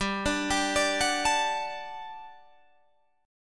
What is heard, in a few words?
G Major 7th Suspended 2nd G down Guitar Drop D